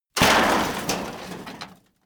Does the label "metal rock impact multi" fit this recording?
Yes